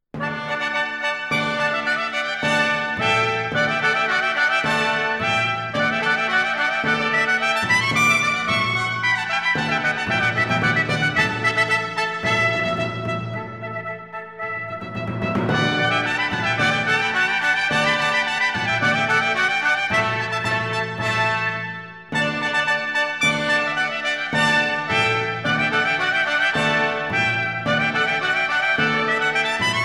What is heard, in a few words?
Fanfare, brass; Three Fanfares; II. Fanfare #2